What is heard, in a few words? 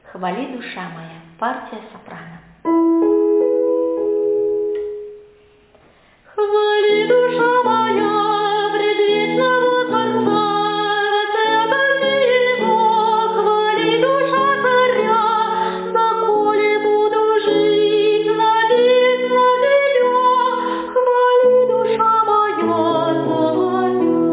soprano; Xvali dusha moja